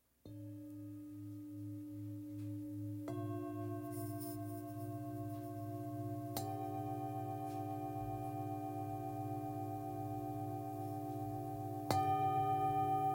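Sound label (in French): Set, bols chantants tibétains; synergie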